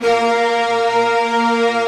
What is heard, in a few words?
fast strings, Fast & Slow; Optical Media International; Sonic Images Library